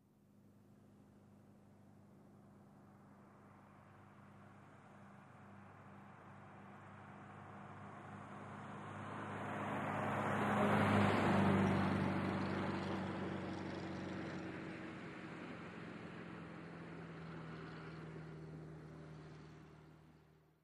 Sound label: Buick, By Medium Speed, Medium Distant; 1938